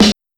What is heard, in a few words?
2000s natural steel snare drum g sharp key